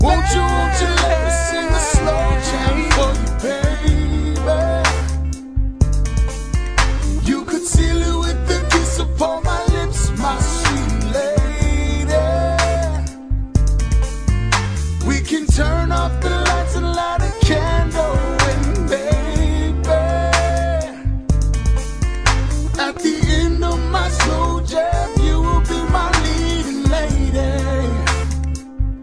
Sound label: Slow Jam